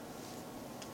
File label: Drip